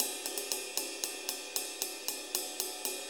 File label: 6-8 Ride, HiHat & Ride Loops